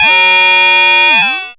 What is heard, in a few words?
a#, Analogue FX, Dance Synths; Samples vol.37 - Dance Synths